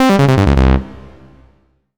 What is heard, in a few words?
Solo Clean Sawtooth Negative; gamerip; MUSIC EFFECT; 05 stereo; Rollerforce